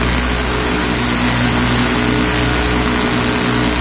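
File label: ambient angry machine; hellhole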